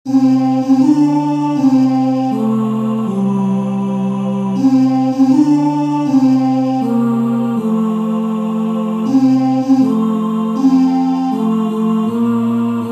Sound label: Happy Birthday tenor